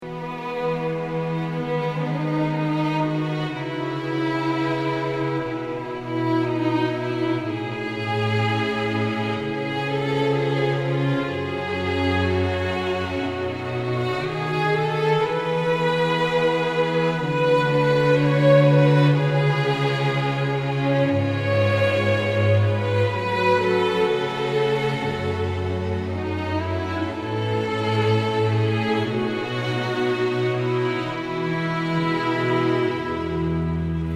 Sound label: strings